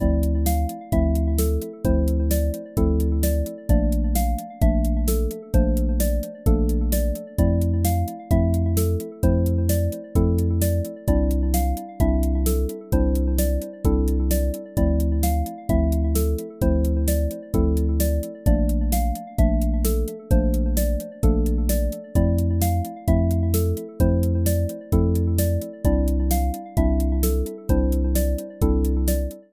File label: comparison basic 16 bars